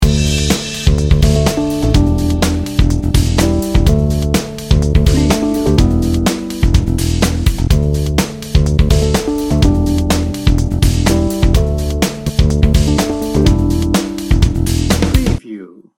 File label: energy field loop